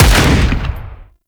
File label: Sniper Rifle Shot; Warframe; Grineer; Gorg